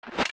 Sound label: Link Item Away; Link putting away an inventory item